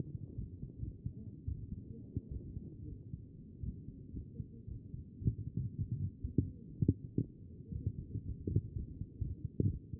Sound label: CHEST pos4 B MODE